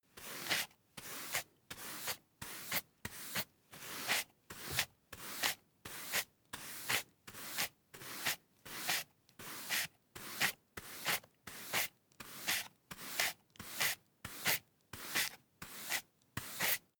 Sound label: broom hand sweep sequence dustpan long